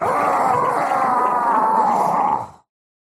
pain laser; Slork; akira

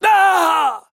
pain death; Trapper

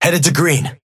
Synth ping headed

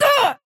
pain small

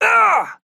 pain small; Trapper

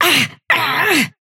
pain death

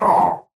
pain small; Slork

